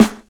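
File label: hot snare sample a key, tuned to the A note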